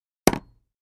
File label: Axe 2x4, axe hits